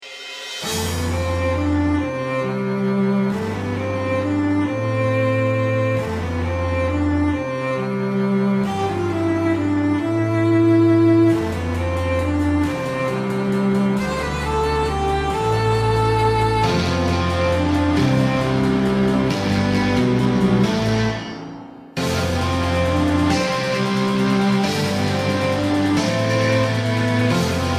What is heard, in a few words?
song